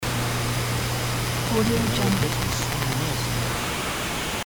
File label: tv static